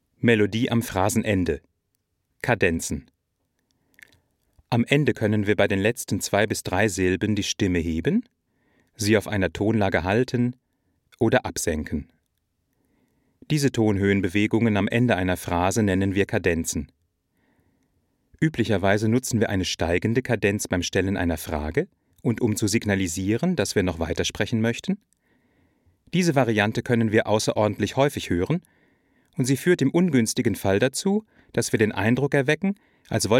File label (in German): Kadenzen